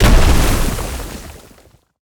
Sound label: statue collapse